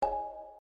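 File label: Orchestral Slide Close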